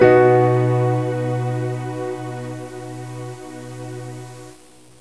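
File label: PIANO STRINGS B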